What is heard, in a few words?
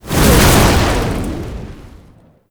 Flight Start; Warframe; Uriel; Infernalis